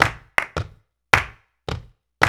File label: 108 CLAP; OZ!